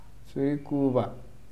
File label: tone audio